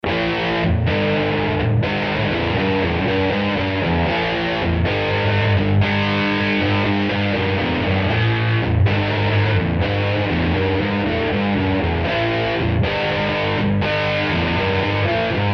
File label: Power Riff Humbucker Front